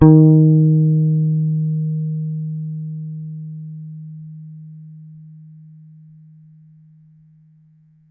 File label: Bass High Eb, Electric Bass One Shots; Slate Digital BASSHOLE Sample Pack